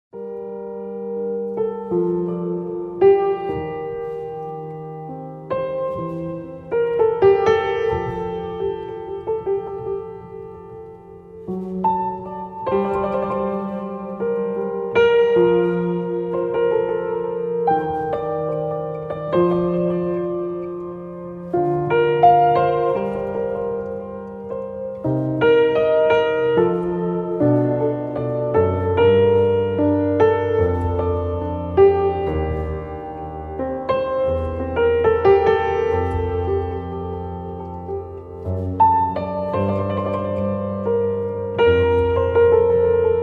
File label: Piano